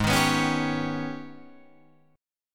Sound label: G sharp Minor Major 11th, Guitar Drop D